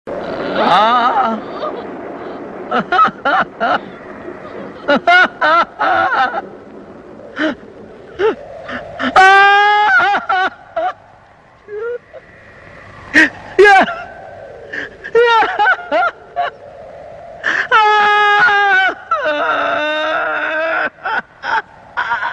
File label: Cry